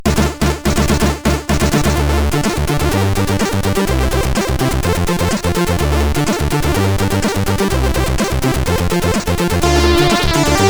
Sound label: tune, CSG8580R5; Aaaargh